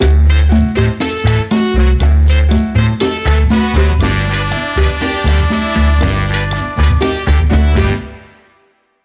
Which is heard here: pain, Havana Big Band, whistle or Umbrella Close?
Havana Big Band